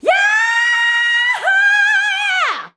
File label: SCREAM; SHOUTS; Best Service; Hyper Dance